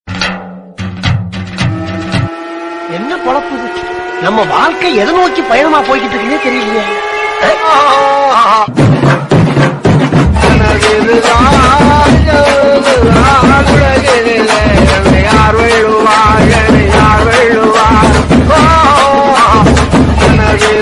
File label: remix; vedivelu